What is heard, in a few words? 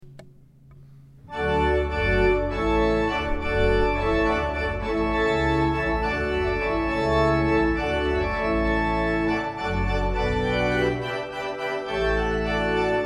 Allegro Maestoso; Water Music Handel; weddings music